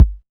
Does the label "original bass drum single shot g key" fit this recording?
Yes